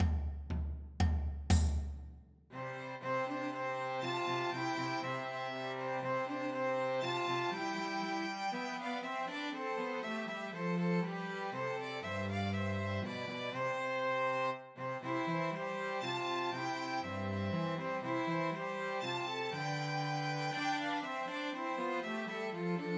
The Holly and the Ivy, Christmas Trios for Two Violins and Cello